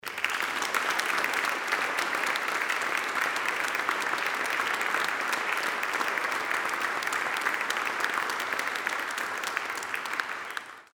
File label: applause